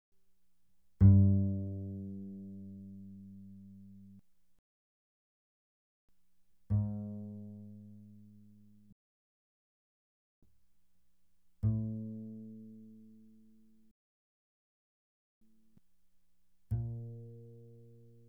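Bass pizzicato mezzo forte sul G G2 B2, doublebass; Strings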